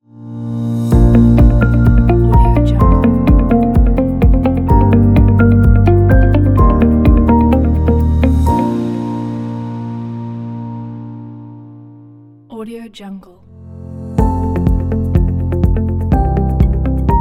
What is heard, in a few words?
corporate logo pack